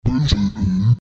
grunt

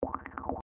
robo

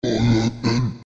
grunt

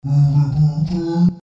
groan

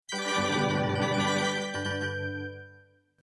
jingle level complete

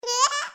hehe ha

